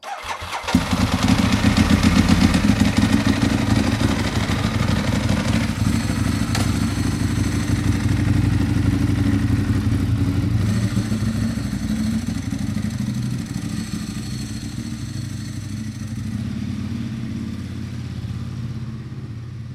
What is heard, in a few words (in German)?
Motorrad starten